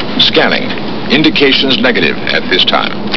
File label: scan; sci-fi sensation; startrek